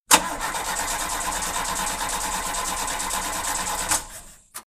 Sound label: Engine Down